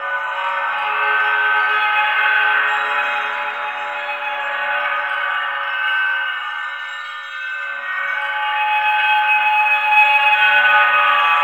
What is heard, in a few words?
Orbital Station Graduation Fanfare Loop